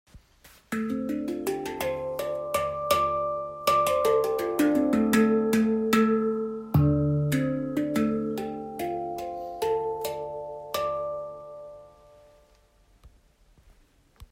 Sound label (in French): 9 notes re mineur pentatonic; gris